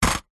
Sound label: household dvd case disc inside set down carpet